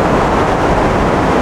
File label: jet; Airdrop